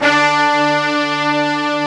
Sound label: brass D3